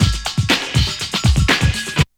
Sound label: BREAK